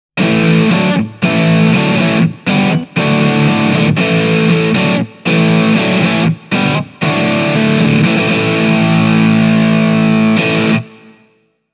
Marshall Code 25 overdriven

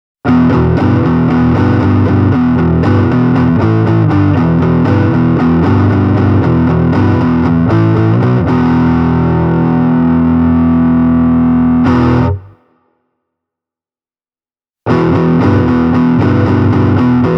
Epiphone Ltd Ed Korina Explorer distorted

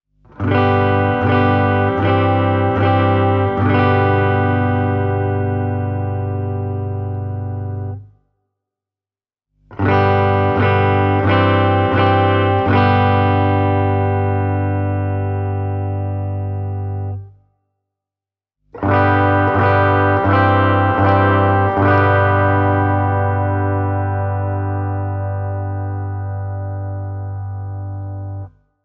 Tokai ES 60 clean; puoliakustinen sähkökitara

